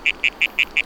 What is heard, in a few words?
Paratelmatobius gaigeae